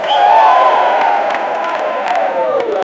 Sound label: a crowd booing and jeerin, stadium